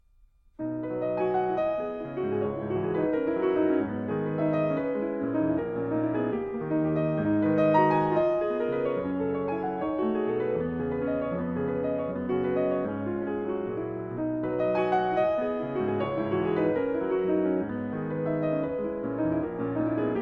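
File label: Etude No 4 in B Major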